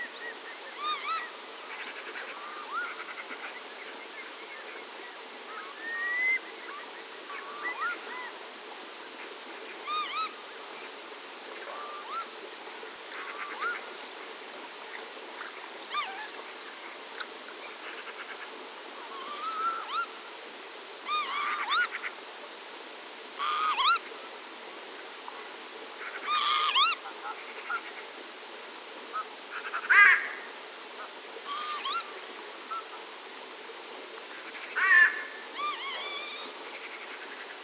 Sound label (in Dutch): Kievit Vanellus vanellus